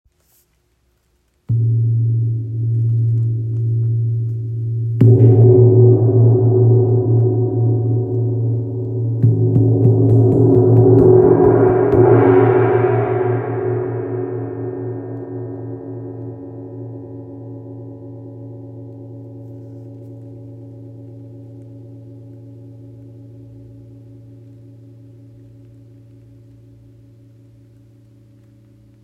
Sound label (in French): Wind gong 60cm la diese